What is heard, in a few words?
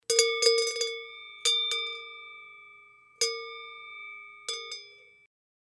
bell